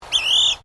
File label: Bird Fan Tailed Cuckoo Chirp, Birds in Queensland; Birds (Sound Effects:Animals)